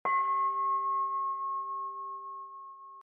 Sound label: tone; organic